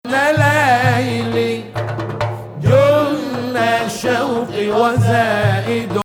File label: Hijaz Octave